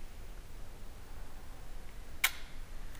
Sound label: laugh or moan